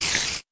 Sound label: spider; Scratch; Mine